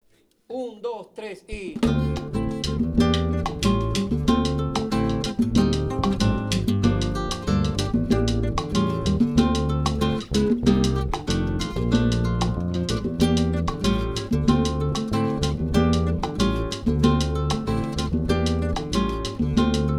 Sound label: Salsa; cubana